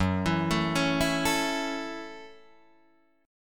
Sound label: F sharp Minor 7th F sharp, Guitar Open D